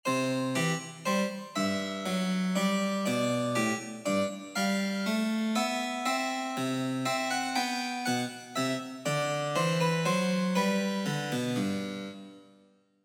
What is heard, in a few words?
minuet